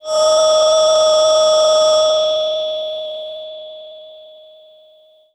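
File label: Breath V DX100 D#4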